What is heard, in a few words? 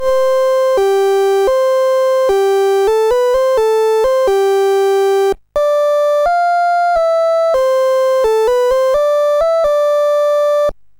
MOS6581R4, GAMES